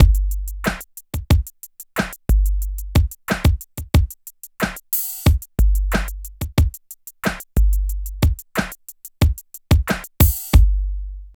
126 LOOP; East West - Phat & Phunky CD2; 90's Sample CDs

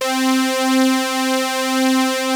POLY SYNTH; Wizoo - Powered Wave

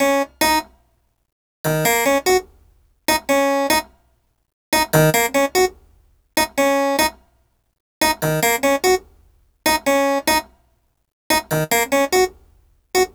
Harpsichord; Sonic Foundry (Sony Creative Software); Cinematic Funk Urban Tone Poems; Session 05 - The Triad

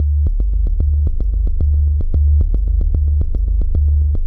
112 D Wall Of Bass, Melodic Loops; Electronisounds

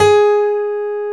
PIANO G#4; Techno Trance; E-MU Formula 4000 Series Vol. 2

